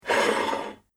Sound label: Medium Sized Dinner Plate Slides; Dishware Sound Effects; Household